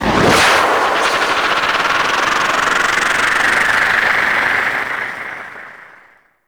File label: Archwing Healer Engine Boost Slow Reverse, Vehicles; Warframe